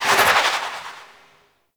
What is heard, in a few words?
Bullet Jump; Fairy